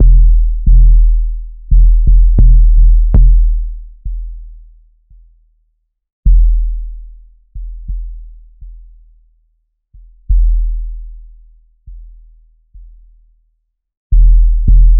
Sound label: low pitch pop japanese so